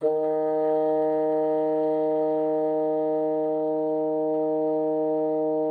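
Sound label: bassoon piano D#3; Xsample VOL-6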